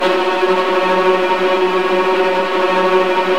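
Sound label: viola tremolo F#1 right, Virtuoso Strings; ILIO; Kirk Hunter Virtuoso Strings VOL-2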